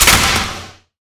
WrkShop Drop Nmbr Plt

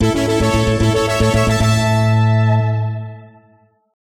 LEVEL UP！(レベルアップBGM); music arrange ai senshi nicol esper dream 2018

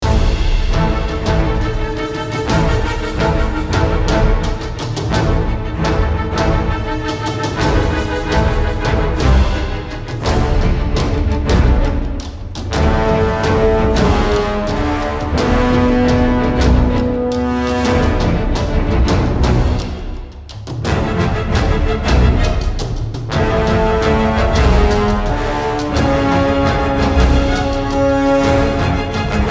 Mx Rappel Action

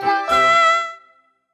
Beats Pickup; Sparklite

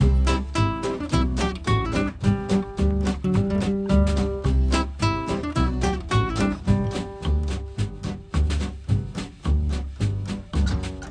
Mountain Turnaround 5 bar; nm hills; TurnaroundA; clumsy ninja; android

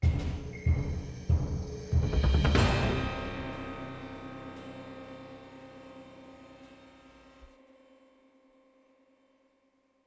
Boss Fight end

